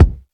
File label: subby bass drum one shot C key, kick drum sound tuned to the C note